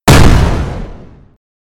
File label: explosion